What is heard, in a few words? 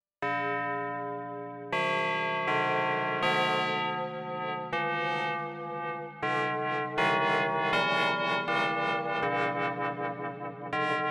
Synth Bells; Vexations